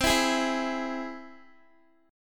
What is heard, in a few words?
C Augmented down Guitar; strummed